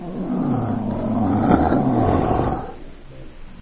attack growl, spitter; svencoop; custom monsters

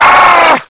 death; svencoop; scshogo; fallenstrooper

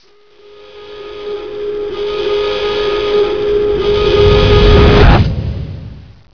intro siren; svencoop; echoes

